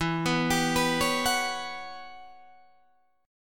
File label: E Minor 6th Add 9th, Guitar, Drop D; chord shape